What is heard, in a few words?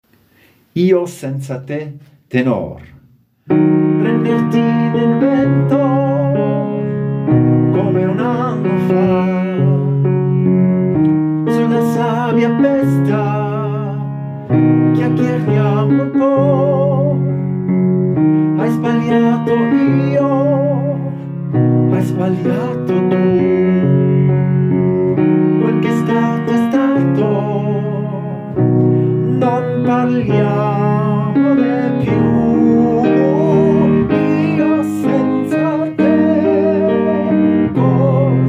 Tenor